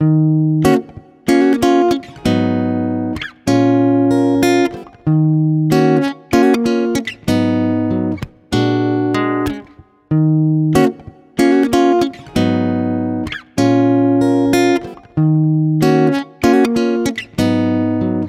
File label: Guitar 95 BPM (D#min); Guitar Loops; Day To Night; Ghosthack; Guitar Loops & Shots